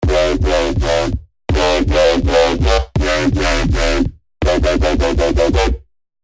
Filter Freak, hard bass; revealed serum 2 hard bass meltdown

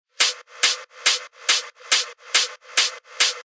techno loop 140 BPM; Eightball; revealed techno top loops vol 8

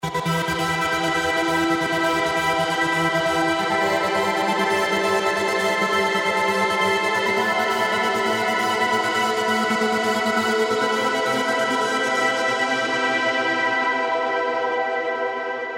Trance Gates; Granular Dream; Revealed Serum 2 Trance Gates Vol. 1

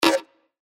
C, percussion; revealed percussion vol 1

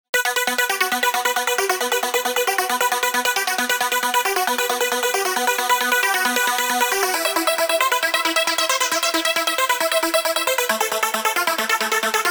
Raver Girl, Spire; alonso 19 year anniversary pack

